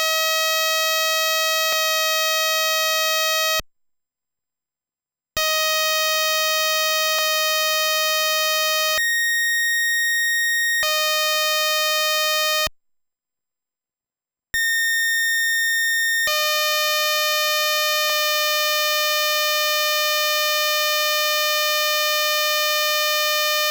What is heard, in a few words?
noise, pokey